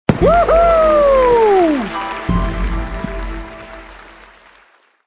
victory; croods

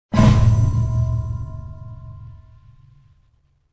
levelup; croods; battlespecial

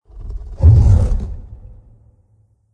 boss idle; croods

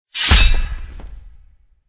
gift, ui; croods

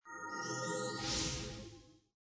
recover hp; croods

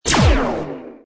bullet create; croods

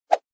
bullet create; croods

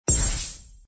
hitted ghost; croods

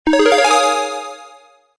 button start game; croods

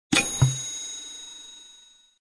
drop equip; croods; battlespecial

